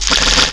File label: Multi Fire, Multi Gun; Crystal Crazy Demo; Casady & Greene